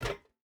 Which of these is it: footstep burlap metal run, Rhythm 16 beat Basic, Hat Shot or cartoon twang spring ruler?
footstep burlap metal run